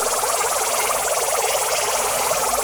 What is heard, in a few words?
Projectile Loop; Weapons; Warframe; Duviri; Incarnon Adapters